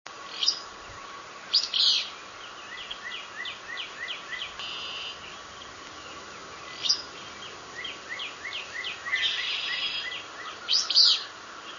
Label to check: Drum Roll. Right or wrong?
Wrong